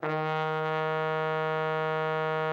TROMBONE; Best Service

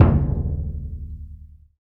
AFRO DUNDUN, LRG DRUMS; Spectrasonics; Heart of Africa

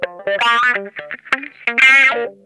TIMER, Funk Guitar; Zero G - Funk Guitar

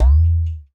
CLAY POT; Spectrasonics; Liquid Grooves; POTS + PANS

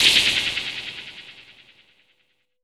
noise percussion; AKAI S6000 CD-ROM - Volume 5

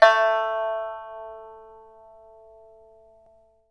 PIPA A4 MP; Spectrasonics; Heart of Asia

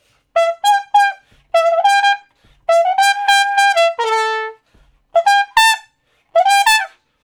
Trumpet Shuffle (E); Solos

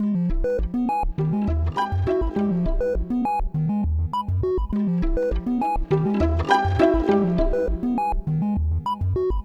Synth Break; Trip-Hop; Loops; Sonic Foundry (Sony Creative Software); Rondo Brothers Trip-Hop Loops & Samples; Liberation

